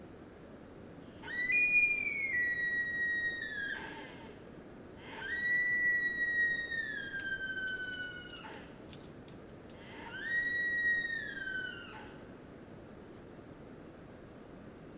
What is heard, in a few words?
deer